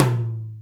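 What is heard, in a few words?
Hi D Amb, Alesis D4 drum machine tom; 300 Drum Machines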